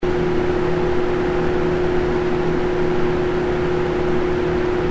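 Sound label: Fuzzy High, Shotgun Windscreens; K-Tek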